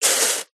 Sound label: foley metal hot water drips into sizzle; zapsplat